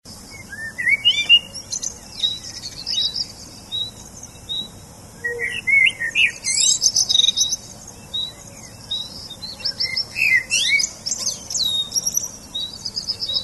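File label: birdsong sounds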